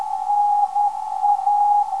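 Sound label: 383kHz, non-directional beacon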